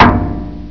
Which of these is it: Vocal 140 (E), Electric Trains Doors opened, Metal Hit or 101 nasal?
Metal Hit